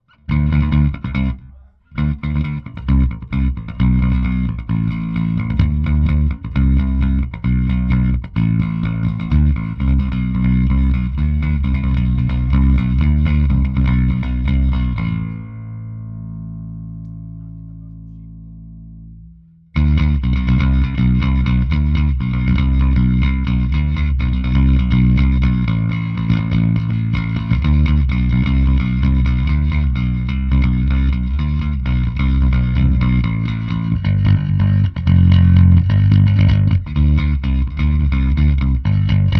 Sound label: bass amp, bass test